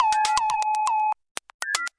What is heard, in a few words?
120 microscopic annoyance